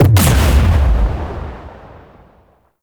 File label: Pillar Ship Missile Explosion; Warframe; RailJack; Proc Ships; Corpus